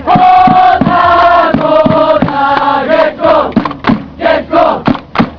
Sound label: call